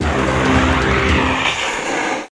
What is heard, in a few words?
f flame